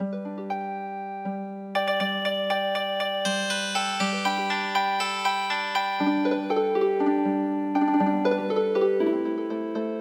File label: Jingle Bells Healing Harp